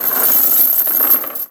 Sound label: Chain Fall, Dojo; Ambience; Warframe; Mod Quest; Teshin's Hideout